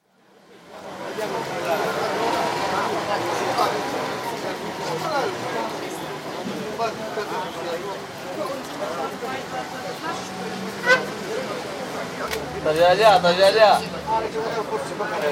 Greece: Cafe atmosphere, with heavy traffic, chat & crockery clinks, also vendor van; Could be used as a general town atmosphere; Restaurant; BBC